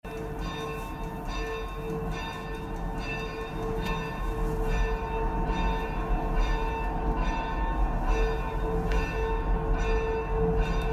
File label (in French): Cloches